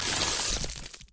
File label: ice magic platform; frozen rush; elsa